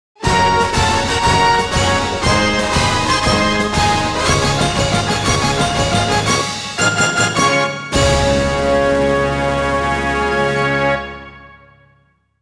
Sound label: Victory Fanfare